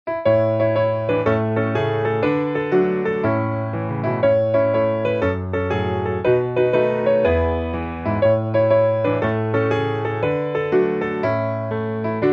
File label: piano